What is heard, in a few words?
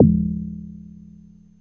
ANALOG BELL; Zero-G; Ambient 1